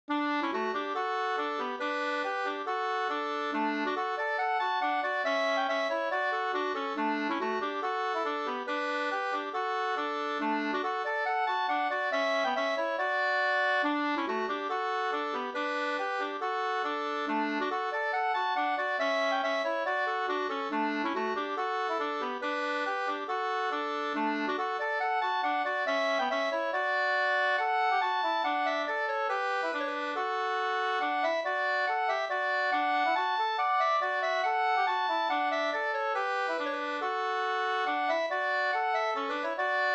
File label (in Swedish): schottis 4-4; e Harald Danielsson Nasteln Jm 2st V1